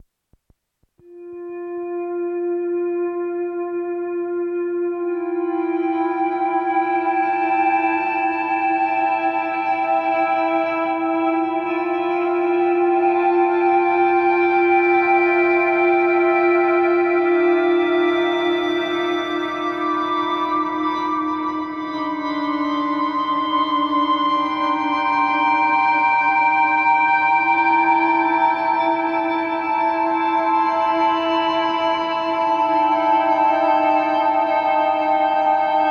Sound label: electronic only